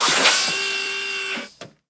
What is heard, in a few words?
delorean door open; vehicles